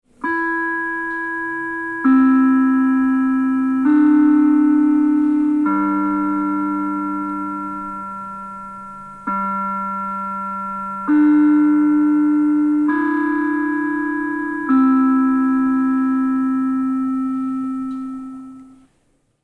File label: Door Chime Big Ben; Doorbells; Tom Hutchings